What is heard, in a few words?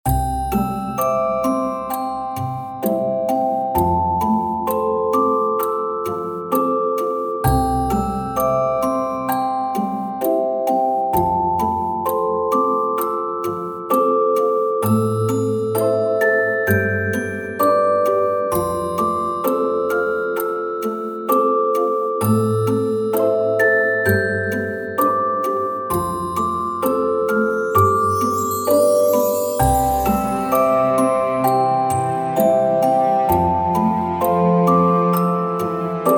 Christmas Eve Main Track With Clock Ticking